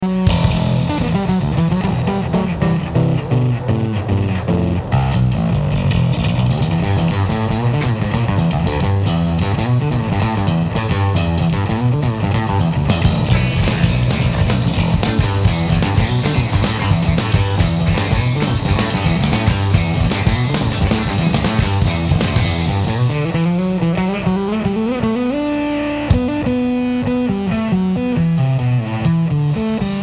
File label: Bass Solo